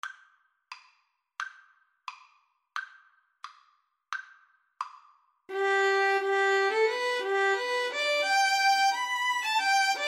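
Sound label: scotland the brave violin violoncello, Violin Cello Duet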